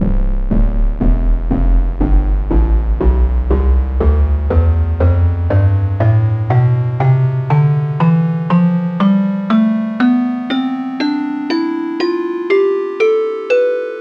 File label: crystal tonleiter